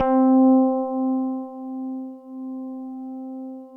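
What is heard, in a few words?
FM electric piano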